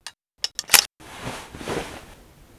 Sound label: Inf Weapons Foley; Red Orchestra 41-45